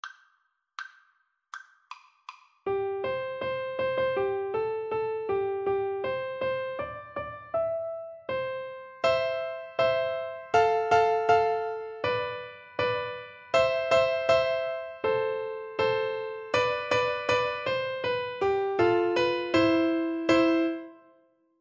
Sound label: Bingo, Piano Duet